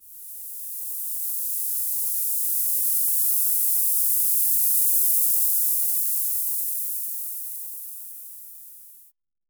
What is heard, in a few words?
rainforst with subtle sna, reptile